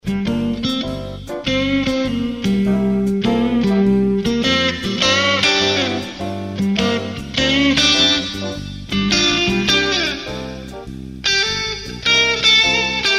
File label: Neck Marsh Stalone solo, strat56